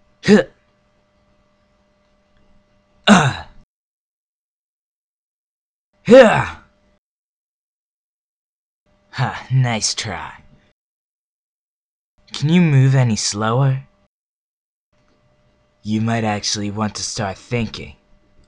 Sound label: grunts and taunts